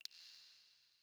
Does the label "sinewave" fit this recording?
No